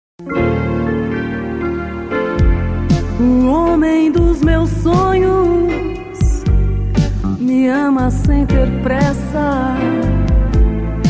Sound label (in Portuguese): Jingle; IpanemaFM; Agencia Jinga